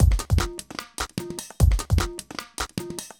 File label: glasto 150